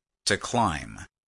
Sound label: scale